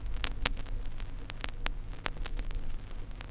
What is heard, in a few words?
Crackle, Vinyl Noise; noise hiss crackle